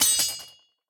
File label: minion battlefoley metaldrop; smite; npc; roman archer